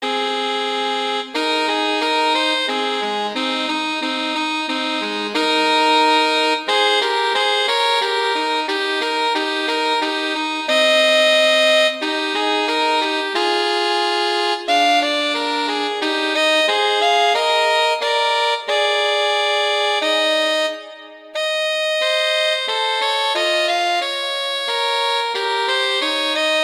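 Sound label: Duet, horn; Mozart; Duets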